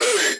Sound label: Vocal Pitched "Ay"